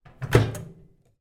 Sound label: household microwave oven door close glass